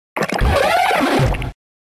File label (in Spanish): Grito de Lickilicky